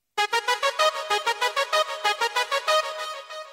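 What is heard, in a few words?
Short Text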